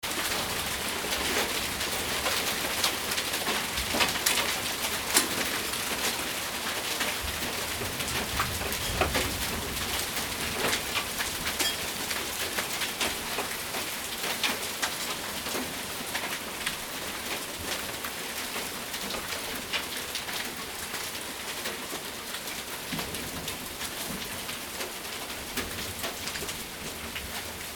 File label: Rain hail & storm